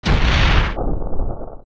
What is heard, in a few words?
Gohma Land